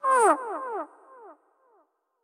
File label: Gigabat Aggro